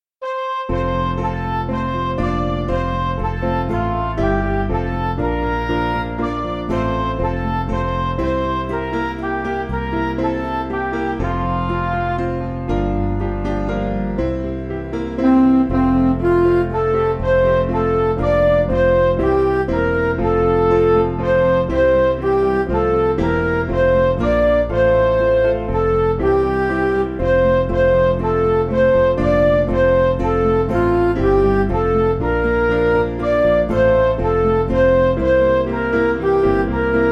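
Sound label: Music Backing Files for Churches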